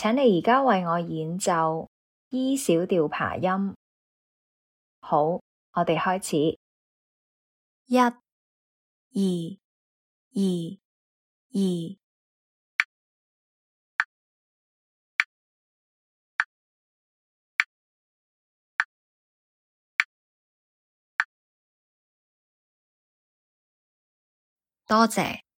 e min arp

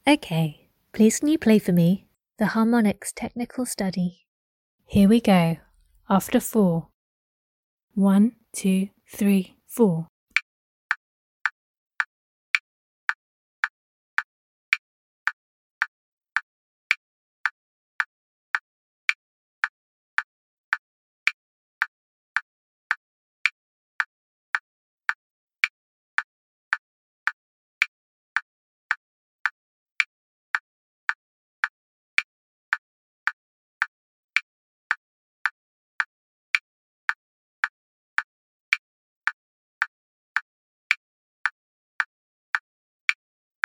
Harmonics technical study

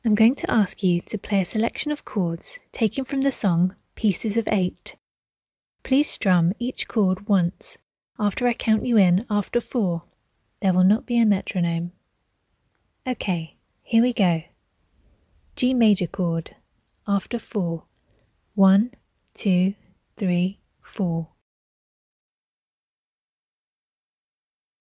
chord of g major a minor

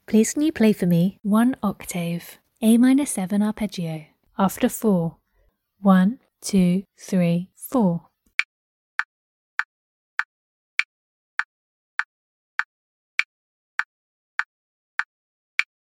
a min 7 arp

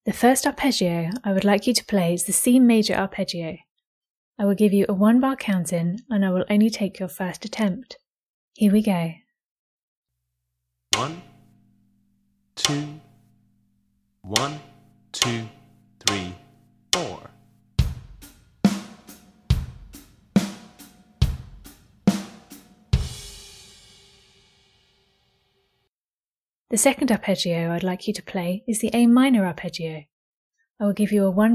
Arpeggios

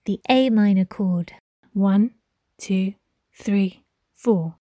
a min chord

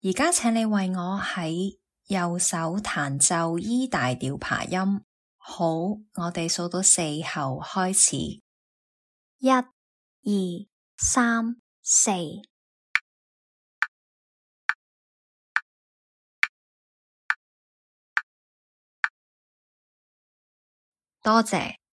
e maj arp rh

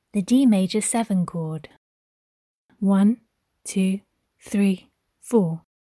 d maj7 chord

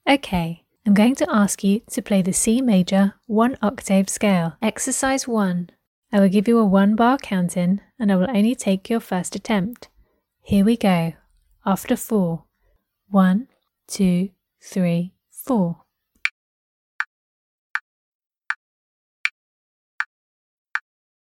c Major 1 octave both

